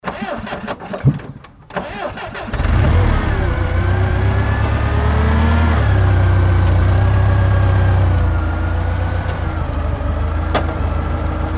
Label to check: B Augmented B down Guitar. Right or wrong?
Wrong